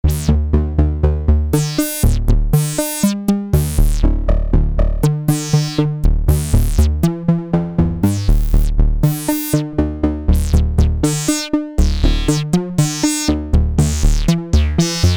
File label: Arpeggiator Random Gate